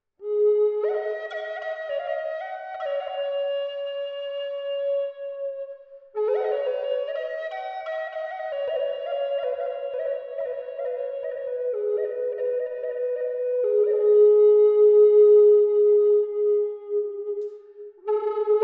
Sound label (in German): Eibe A 432 Hz Chalcedon Ebenholz